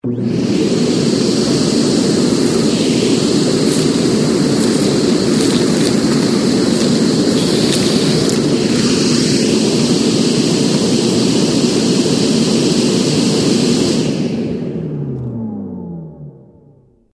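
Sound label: vacuum cleaner sounds; zvuki pylesosa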